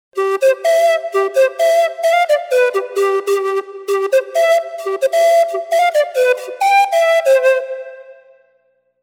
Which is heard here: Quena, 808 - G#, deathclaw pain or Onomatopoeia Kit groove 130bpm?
Quena